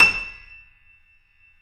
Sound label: YC7 piano, ff